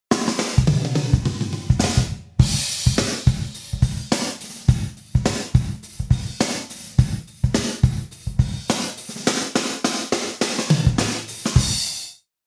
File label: Snares; RaySpace